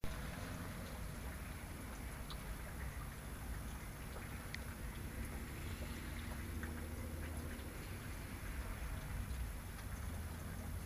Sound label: Raindrops On The Windowsill